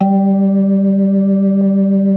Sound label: B3 G 3; Best Service; Advanced Media Trax Modern Composer; B3 LES 1